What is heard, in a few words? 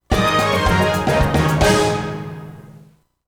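JINGLE MINIGAME END; Inazuma Eleven Everyday; 3DS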